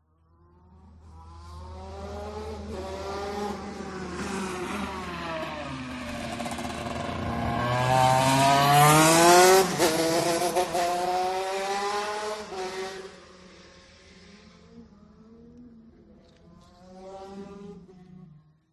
go kart high performance race pass sideline single; go karts; silverplatter audio